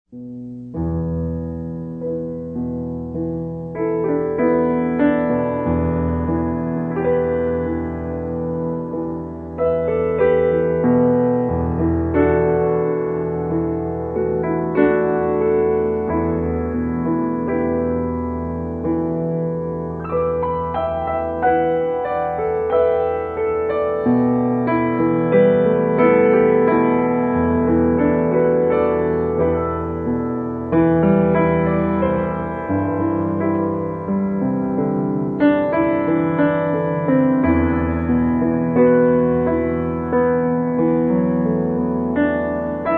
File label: piano